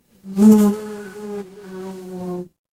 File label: animal insect